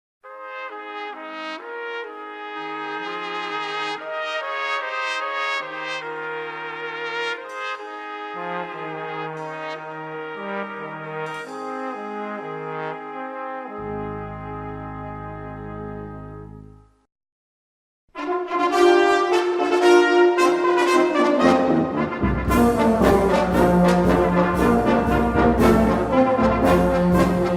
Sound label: Fanfara